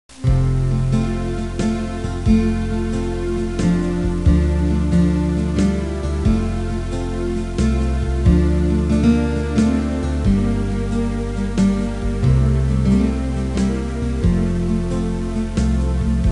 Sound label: BASS